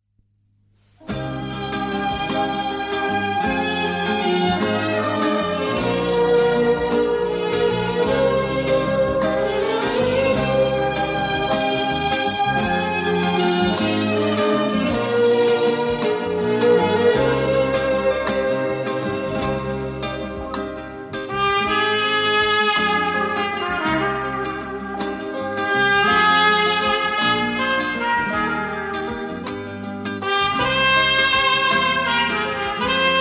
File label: Saxophone